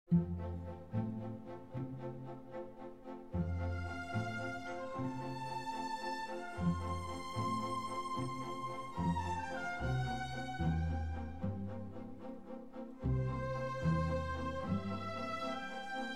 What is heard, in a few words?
piano concerto no21 in c major andante; piano concerto no21 in c major elvira madigan k 467 ii andante